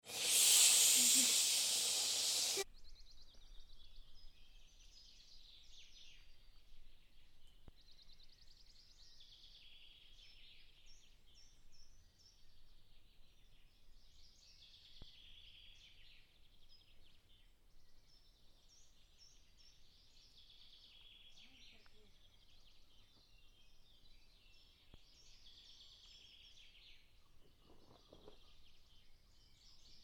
rappel d oiseaux

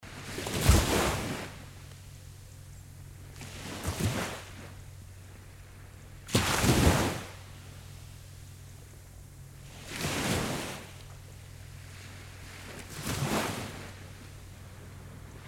mer sable epaisse; fabregas

